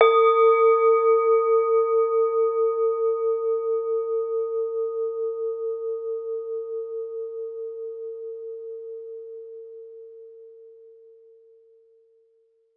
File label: MIXED BELLS + BOWLS